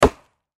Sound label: Impact Axe Chop Tree Hit Wood; Axes; Sound Effects:Tools; Blastwave